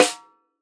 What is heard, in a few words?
jungle snare